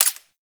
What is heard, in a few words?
Bladed Pistol Mech; Warframe; Weapons; Tenno